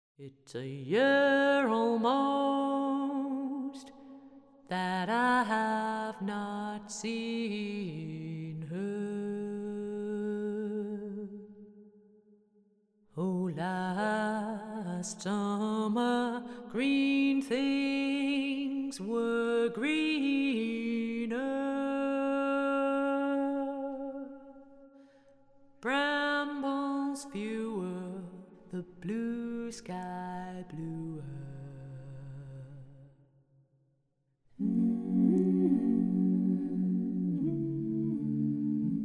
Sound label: A Bird Song